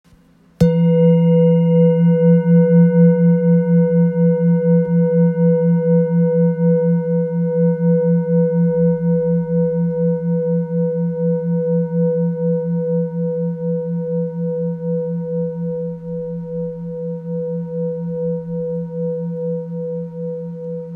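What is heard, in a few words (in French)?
Bol therapeute fa, bols chantants tibétains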